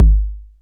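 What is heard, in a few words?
bass drum 808 Sat Click Decay B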